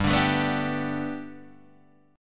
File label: G Major Add 9th Guitar Open D, chord